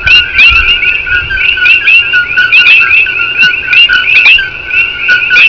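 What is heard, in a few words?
lots of frogs short, Alien Species In Hawaii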